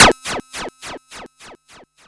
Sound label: ECHO FX 2, ANALOG ECHO; Zero-G Ambient 1